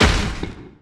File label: asteroid damage metallic medium; Factorio